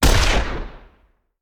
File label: Rifle; Sound Ideas; Guns